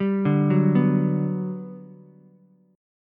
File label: G Major 7th, Ukulele; arpeggiated; JGuitar